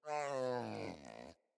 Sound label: Pet Yawn; Catbrow; Pet Ship